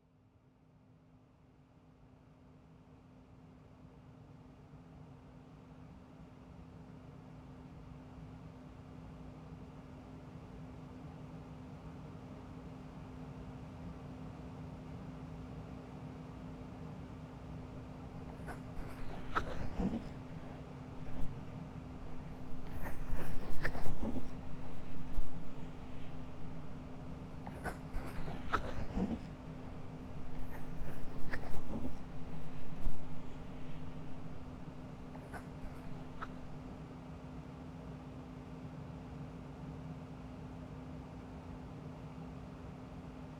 寝台客車で耳かきを（ゴムの螺旋式＆ヘラ型耳かき。ティッシュで仕上げ。左耳）ASMR

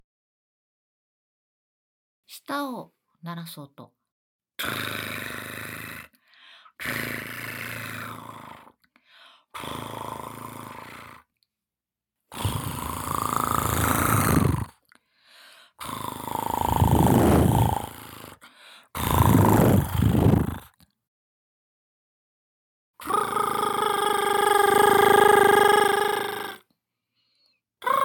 舌をブルルルする音, ASMR

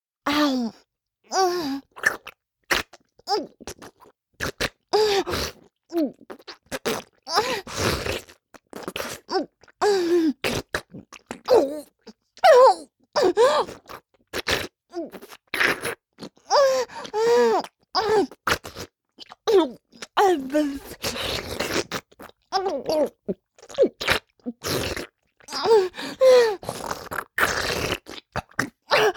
フェラチオ(強)：頭を前後に激しく振って陰茎しゃぶる・時々えづく; 実用ボイス抜粋集

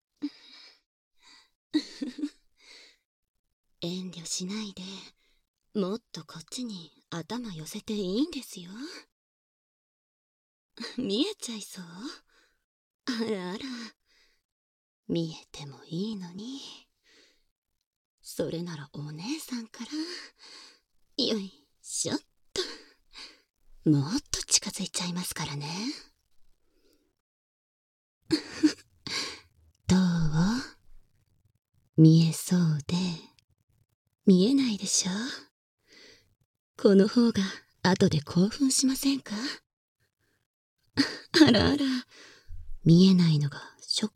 オイル耳かき（バイノーラルマイク収録 効果音あり）; 逆耳差分

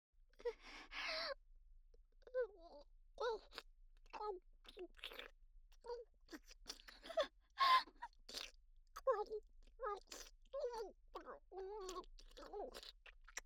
イラマチオ・恐怖に泣きながら口に咥えている声; おとなし少女系カノジョ

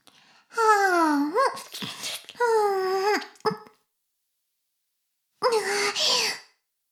フェラ音声; 古き城のドラゴニート2

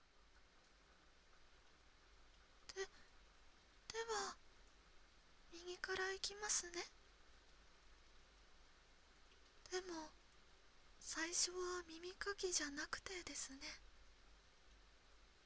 右耳 の マッサージ; お隣さん梅雨

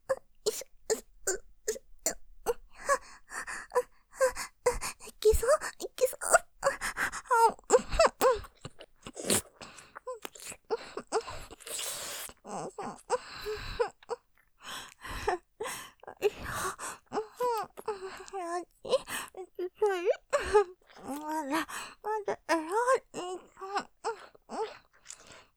喘ぎフェラ; パイズリ＆フェラ・切なそうに絞る感じで; ループで楽しむ！シェフの気まぐれ抜き抜きコース; モノラル

